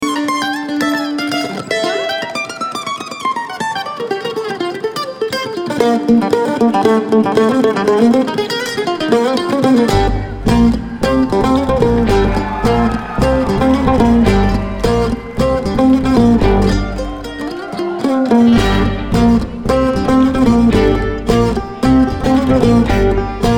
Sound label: Kentucky Mandolin